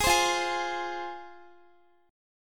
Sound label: F Minor, Guitar; strummed